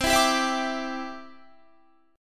C Major Add 9th, Guitar; Cadd9 strummed

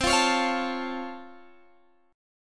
C sharp Augmented Major 7th, C

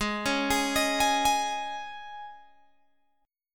G sharp Suspended 4th G sharp, Guitar Open G

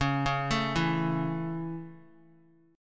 C sharp Minor Sharp 5th down Guitar; C#m#5; arpeggiated; JGuitar

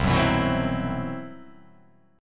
C Major 7th Flat 5th C

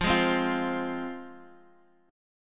F Minor, F, Guitar, Open D; x,x,3,2,3,3

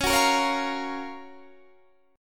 Gb Major 7th Db, guitar

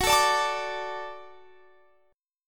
Gb Minor Sharp 5th; Gbm#5 strummed; x,21,19,19,22,22

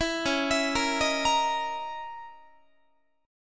C sharp Minor 6th Add 9th C sharp, Guitar; C#m6add9 arpeggiated

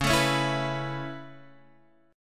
Db Minor 7th Sharp 5th Db down Guitar Standard; strummed; JGuitar

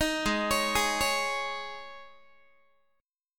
G sharp Suspended 4th Guitar chord, down; arpeggiated

